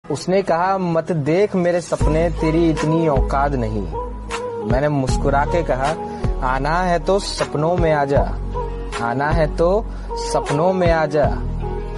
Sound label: New Hindi Ringtone